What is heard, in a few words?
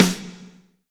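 snare drum, Real Kit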